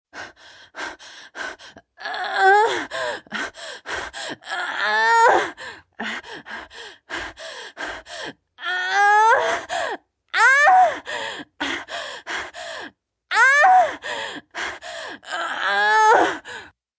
Grunt Labour Pain, Grunt, groan, strain, female, labour pains, pregnant; VoiceBox